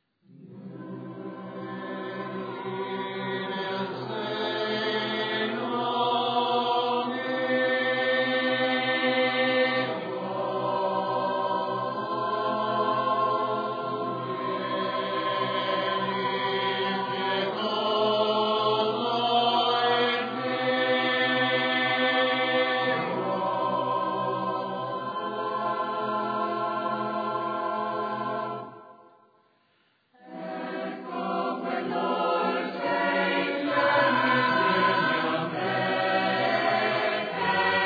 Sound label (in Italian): Vieni vieni, canto